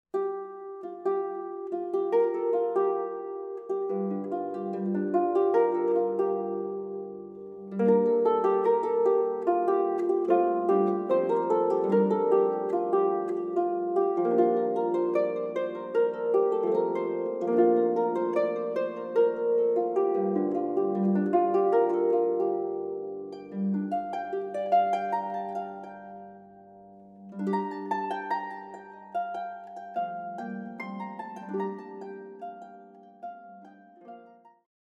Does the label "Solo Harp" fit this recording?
Yes